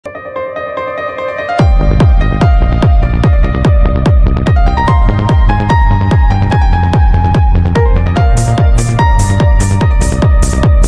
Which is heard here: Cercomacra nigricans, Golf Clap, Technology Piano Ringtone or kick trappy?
Technology Piano Ringtone